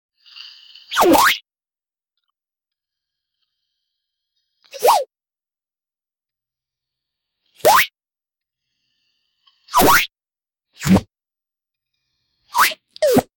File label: a cartoon style comical, banana peel